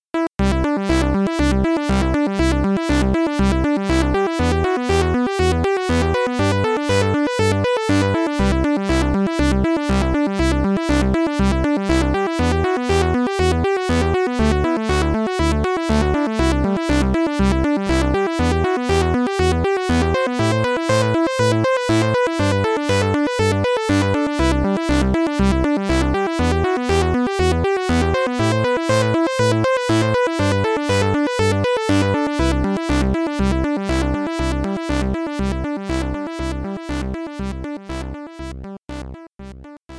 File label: ARP